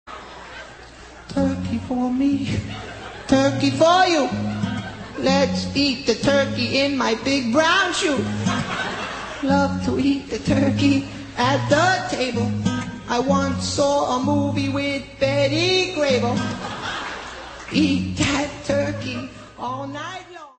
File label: turkey song